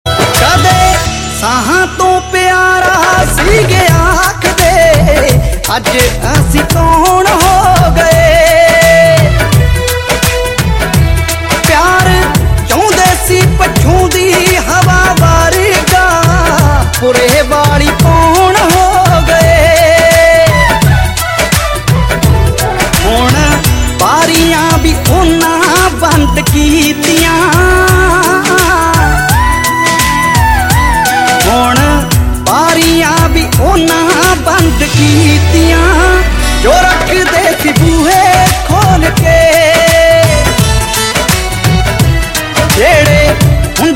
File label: Punjabi New Sad Tones